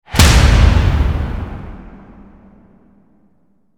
whack hit big

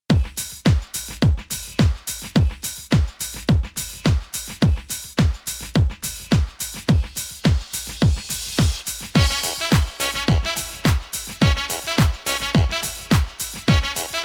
dance instrumental

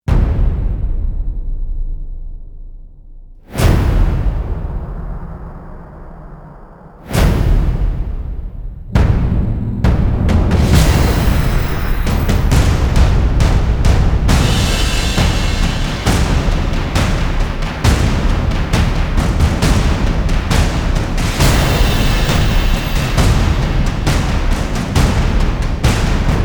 drums

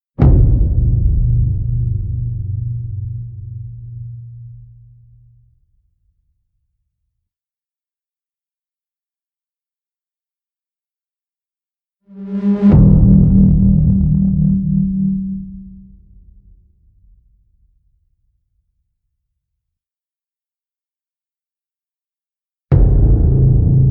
sub booms made of bass

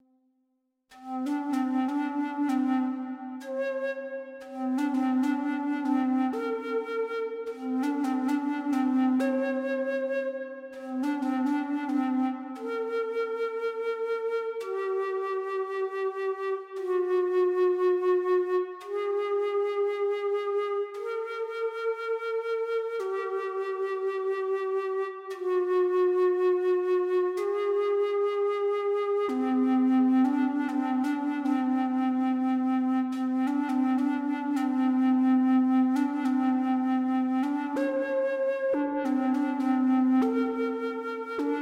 native american flute

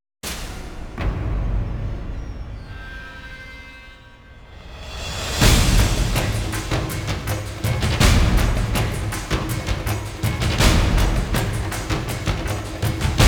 percussion only

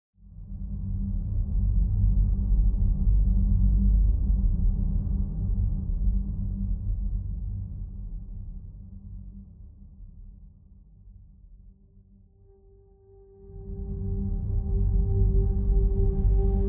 ambient

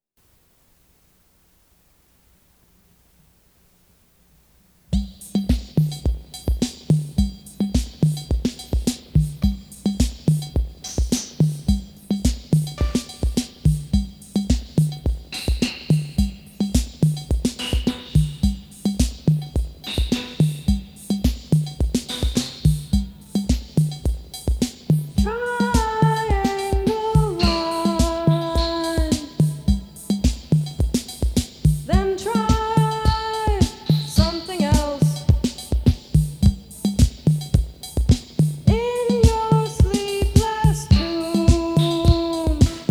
salsa

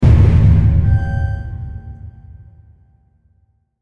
nemesis hit deep; Ninja Tracks

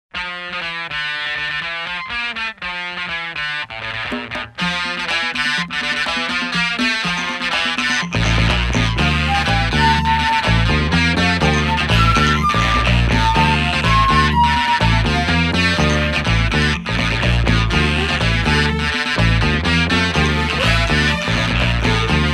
instrumental

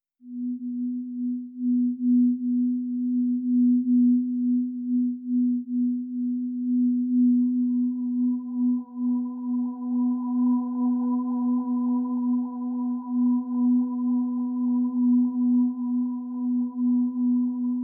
pads and piano

